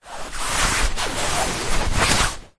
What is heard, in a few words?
household small foam chair fabric movement; zapsplat